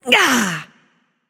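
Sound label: grunt hit, female; smite; voicepack; hera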